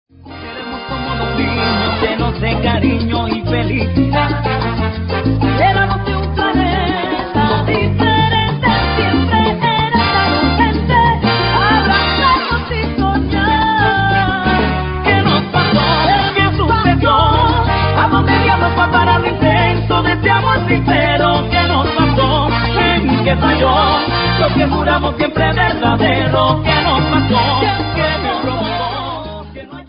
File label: salsa